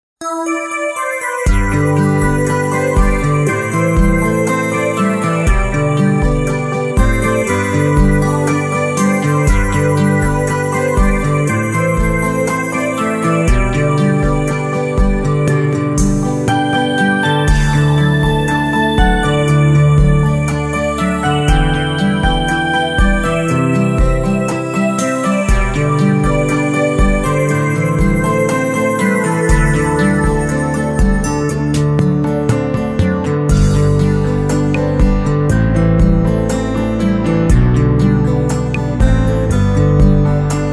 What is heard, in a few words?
Korg Pa3X